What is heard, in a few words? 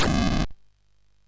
Shuffle, MOS6581R3; hvsc